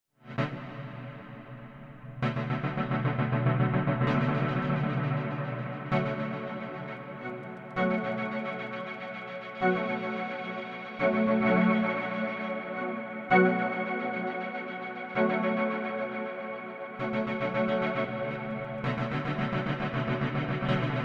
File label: casio micro track